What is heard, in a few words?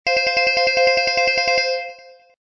Attention, Samsung phone tone; SGH-Z320